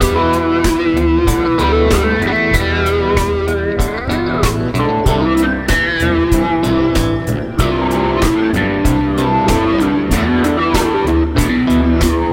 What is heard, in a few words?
Muddy Bog Baritone Talkbox Blues 95 bpm